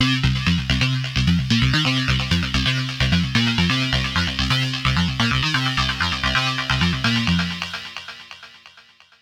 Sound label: Bass loop 130 bpm B flat; Jupiter